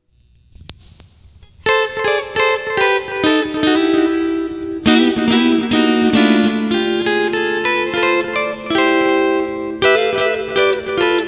Delay, Boss GT-8

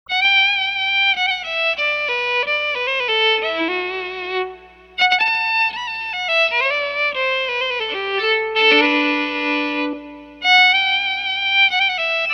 Electric violin; Silkyn Tube Amp

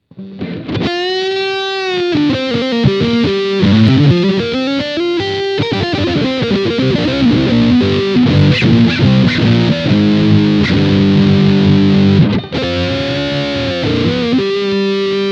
R-FIER SCREAM, Boss GT-8

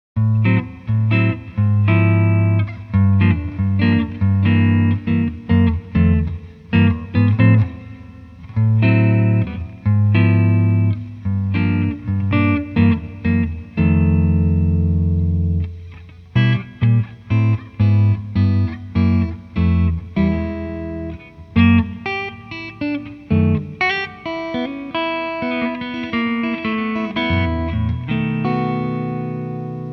archtop electric; Silkyn Tube Amp

